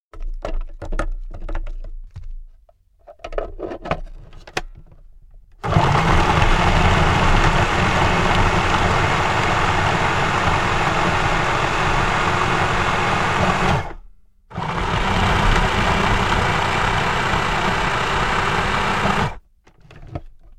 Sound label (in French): robot